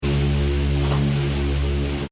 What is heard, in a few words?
boat; the wild